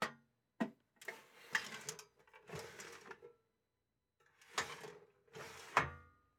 Moving Heavy Metal Equipment

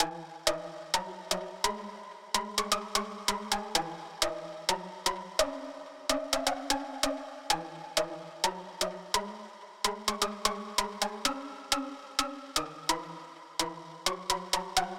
128 BPM G# Min Pluck; House; Loop Stems; Cymatics; Breakdown Loops; Take Me Away

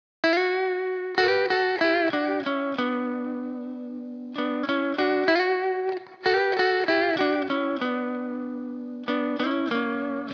95 BPM B Min Lead Guitar; Loop Stems; Cymatics; Miami Guitar Pack - Pt 1; Breeze

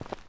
Footstep Dirt Medium; Footsteps

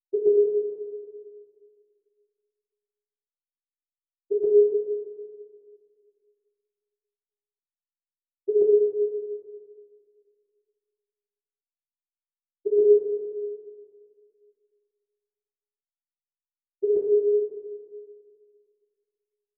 115 BPM C# Min Pluck; Infinity Melody Collection; Cymatics; Take Me Somewhere

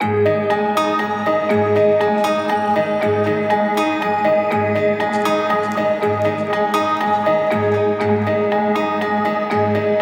Guitar Arp - Abmaj 60BPM; STEMS; Ghosthack; SkyRealm; Mood Kits; Mood Kit 06 - Inner Reflection

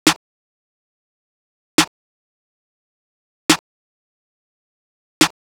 Drum Loop 140 BPM Snare, Hip Hop; Drum Loops; Loop Stems; Cymatics; Carota

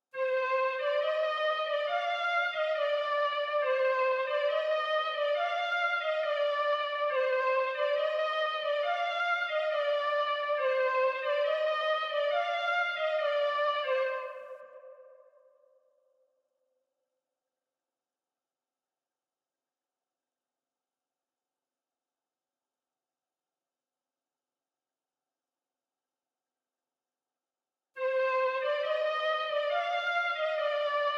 138 BPM C Min Flute High; Melody; Trap; Loop Stems; Cymatics; 2022 Melody Collection; Stick Up

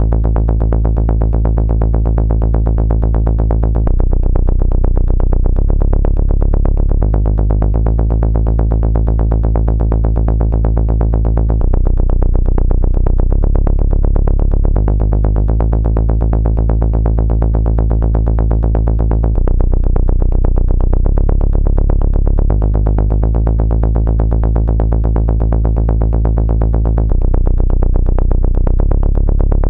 124 BPM C# Min Bass, Euphoria; Cymatics; GHOST (Beta Pack); Come Back

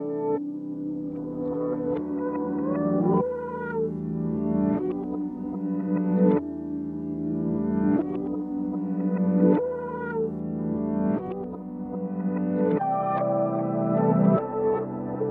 150 BPM G Maj, Reverse; Wishing Well; Cymatics; 2022 Melody Collection

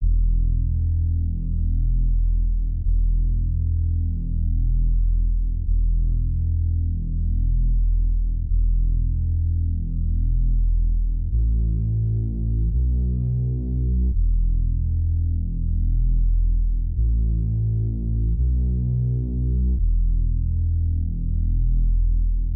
170 BPM E Min Bass; Loop Stems; Cymatics; 2022 Melody Collection Platinum Expansion; Touche

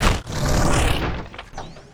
wooshes transform sweetener

